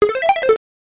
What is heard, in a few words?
Coin; Dig Dug II; X68000